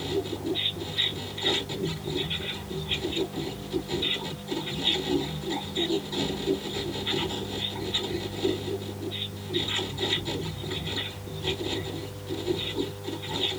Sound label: TV; MiSide